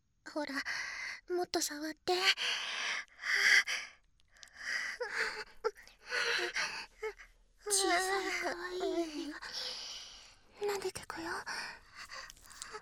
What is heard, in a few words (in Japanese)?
ショタっ子と本番【BGMなし】